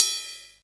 wet ride cymbal one shot c sharp key

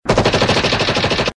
machine gun firing

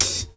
dry open high hat f key; Duration 0:01; Samplerate 44100 Hz; Channels Mono

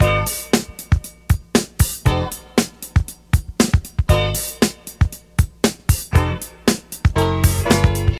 117 bpm fresh disco drum groove g sharp key

